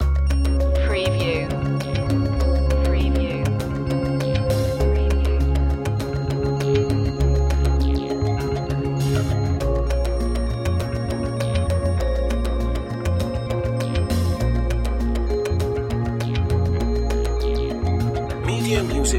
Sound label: building up loop